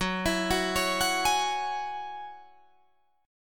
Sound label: Gb 7th Suspended 2nd Gb Guitar Drop D